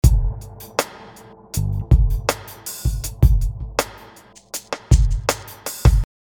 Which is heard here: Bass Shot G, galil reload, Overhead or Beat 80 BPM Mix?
Beat 80 BPM Mix